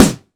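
snare drum sound c sharp key, Royality free snare drum tuned to the C# note; snare drum samples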